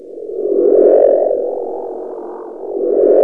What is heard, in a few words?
UFO, SYNTH; East West - Global Sounds; SYNTH FXS